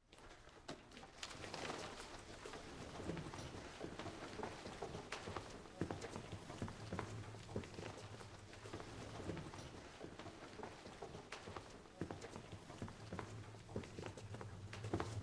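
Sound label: audience leaving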